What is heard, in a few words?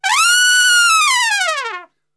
F#4, Brass; Best Service; Brass Super Section; TRP LNGFLL